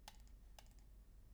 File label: mouse; IEEE AASP